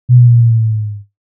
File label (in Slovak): brum